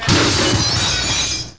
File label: giant glass break; Sound FX Real Life